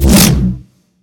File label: swing large; smite; god arthur